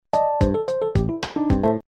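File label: power off; LG KU3800 Bubble Pop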